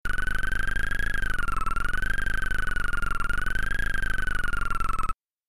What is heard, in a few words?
Bleeps Rapid Oscill